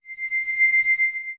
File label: Warp